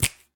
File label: Snap